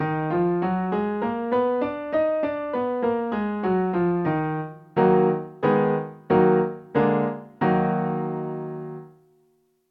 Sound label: E flat harmonic minor scale and primary chords